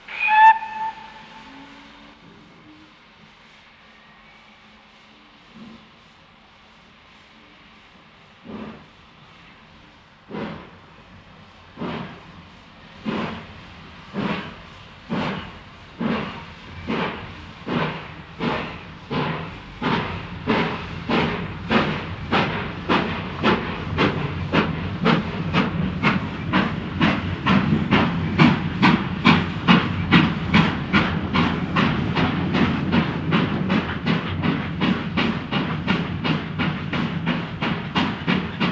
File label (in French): Départ Train à Vapeur; terreur sur l'orient express